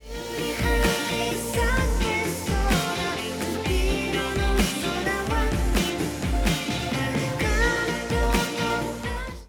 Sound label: tape